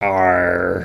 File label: Dragon Emote